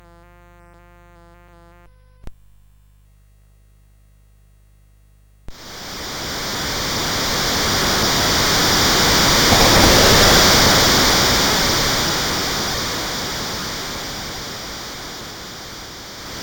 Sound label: Window Shutter; CSG8580R5